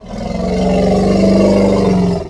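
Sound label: Mutant search; lmctf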